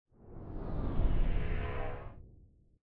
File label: ufo audio; c2c